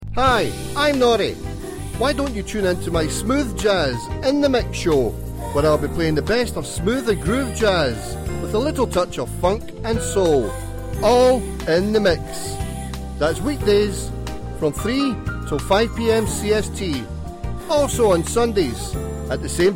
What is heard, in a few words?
smooth jazz